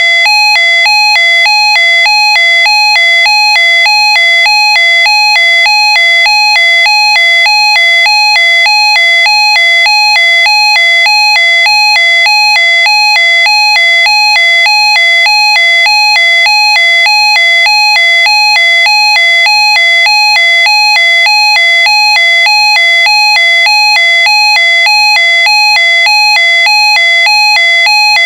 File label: special warning